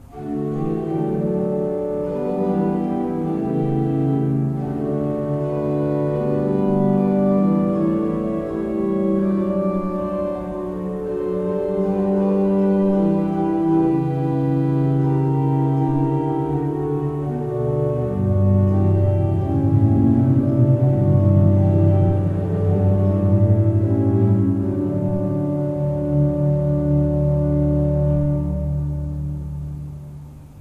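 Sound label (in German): Seifert Koeln, pfeifenorgeln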